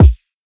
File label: kick dirty; Drum Sample Pack; One Shots; Slate; SEISMIC